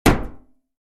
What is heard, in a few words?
projectile metal hit